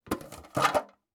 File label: Open the metal box; افکت صوتی